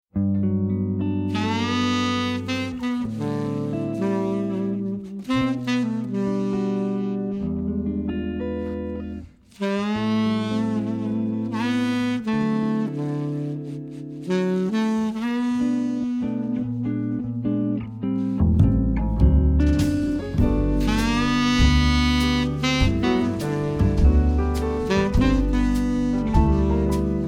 Jazz Instrumentals; Desayunos

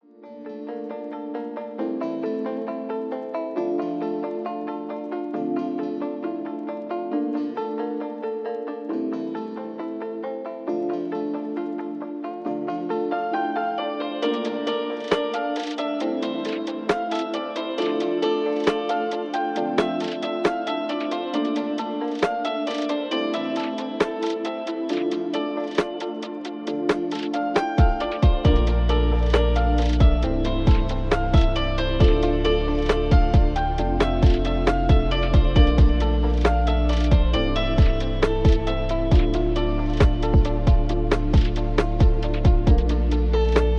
instrumental lofi

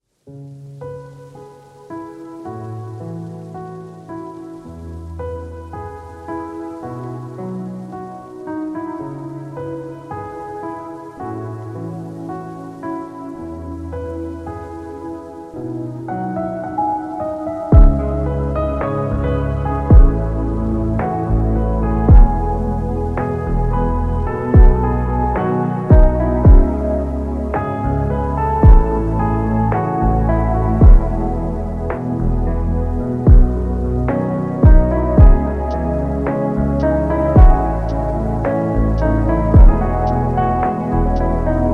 lofi instrumental

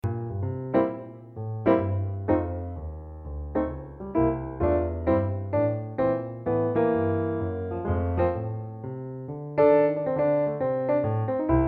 Jazz Piano Trio - Solo Piano Blues Improv; Bossanova

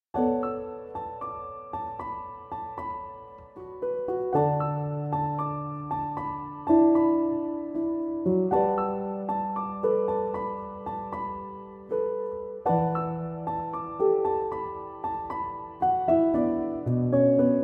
piano instrumental

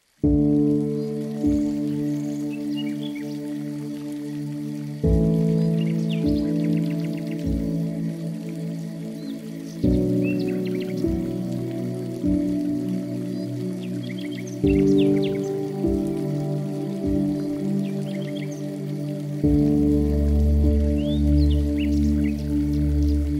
Spa music with water and birds

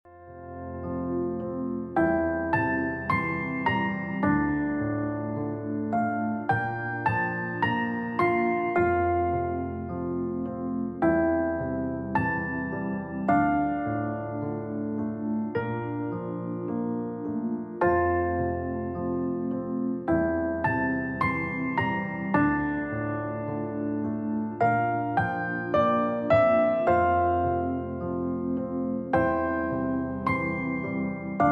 Piano Relaxation

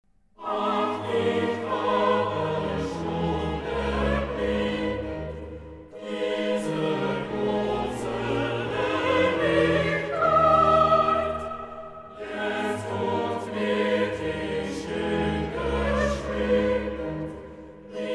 Choral (Coro); BWV 162; Ach, ich habe schon erblicket

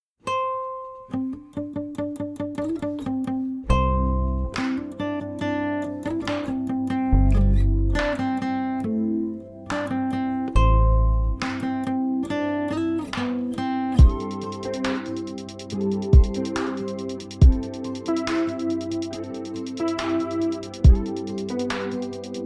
Instrumental; Pop instrumental

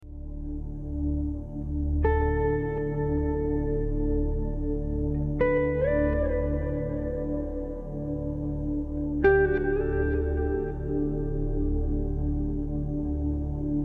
Soothing Spa Music

